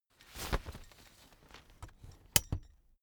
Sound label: umbrella big open full soft